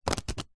leisure playing cards stack fast; zapsplat

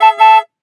Alarm - Car Horn; Sound Ex Machina

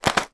food snack mix pack down on table; zapsplat

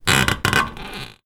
household drain pipe bathroom stand on creak; zapsplat

